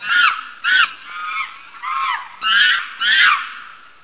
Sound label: monkey